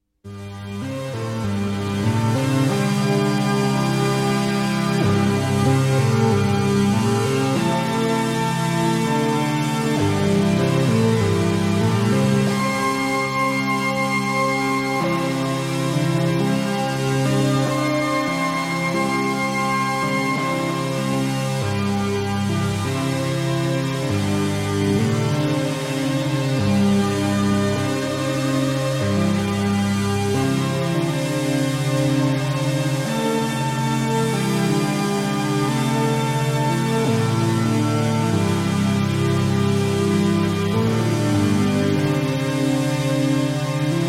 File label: Pomp Melody, synthesizer